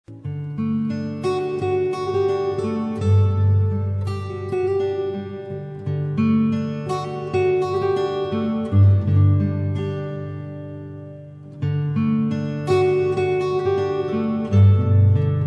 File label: acoustic guitar